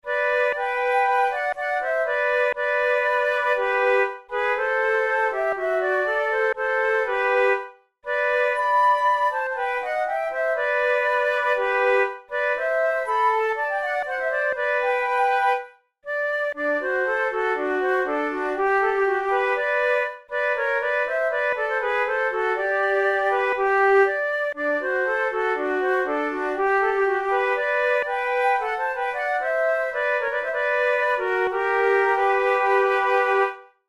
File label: portuguese air duet, flute